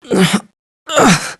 uh ugh

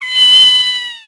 meow

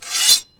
sword; buckler

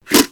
visor down

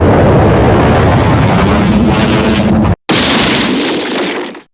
transform; alba

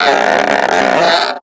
unbend

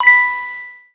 lightbulb

